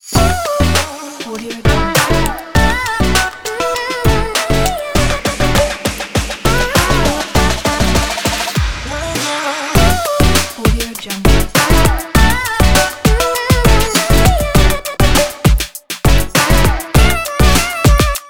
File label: upbeat fashion pop logo